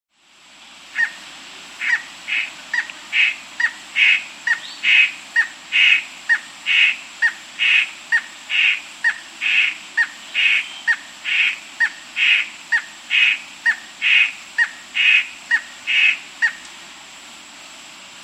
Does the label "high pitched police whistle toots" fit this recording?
No